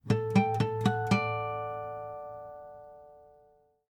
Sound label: Paper Guitar, ringtones; Samsung Galaxy J3 Prime